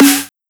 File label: Snare